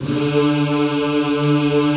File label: D2, Extended Classical Choir; Best Service